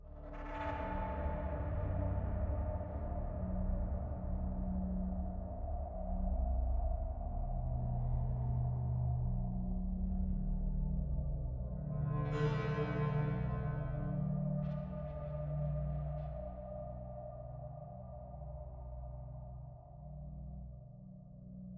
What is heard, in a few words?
Spooky Ship Mood